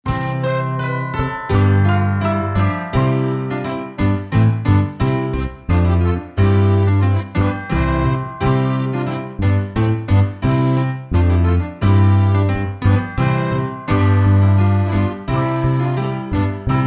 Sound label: SOLO PIANO; Deck the Halls